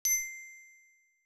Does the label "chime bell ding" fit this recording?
Yes